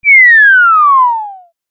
落下

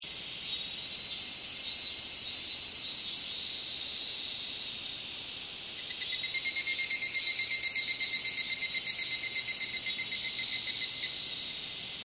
ミンミンゼミ ヒグラシ ループ

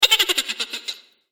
おばけの笑い声

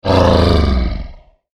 クリーチャーボイス

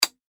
メトロノームのカチッ

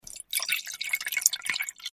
水を注ぐ

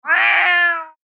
ネコの怒り声

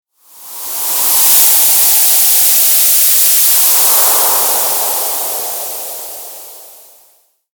風 そよ風

